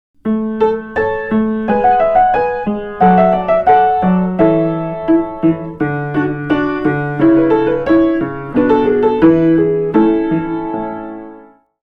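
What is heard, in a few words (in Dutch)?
akoestisch of electrisch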